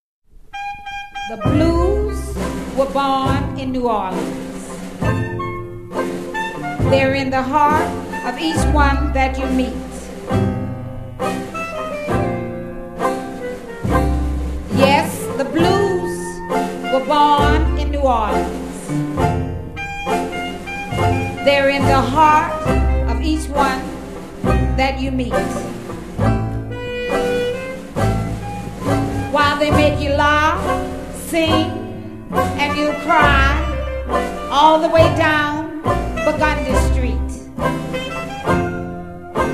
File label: Blues, Jazz Band